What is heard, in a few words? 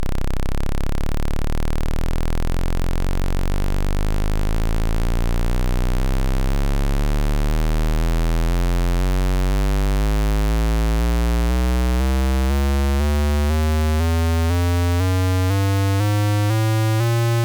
pulse